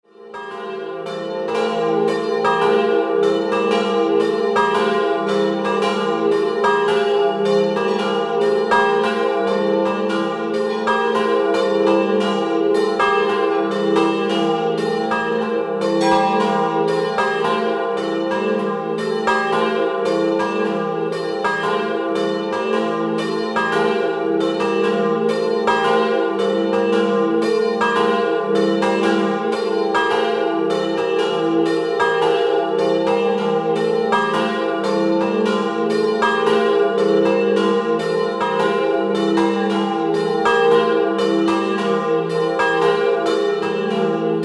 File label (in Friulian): Dopli, campane; Bueriis